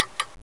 Clock; 101-in-1 Explosive Megamix; WiiWare

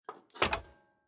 Mailbox Close

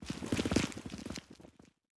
Passby Debris

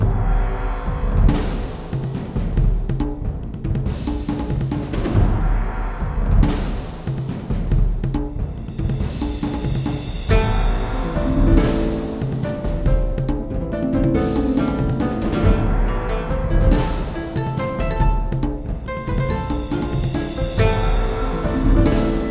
Default Menu Medieval Theme (Looped)

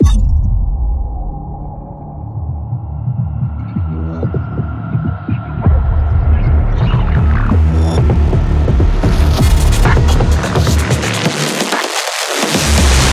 Boss 1 Biome 1 Lima Buildup; Voidbreaker; ps5 windows xbox series xs

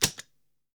Luggage Open

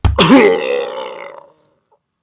Balls Kick; Talking Santa; Android

